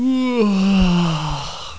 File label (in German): Gähn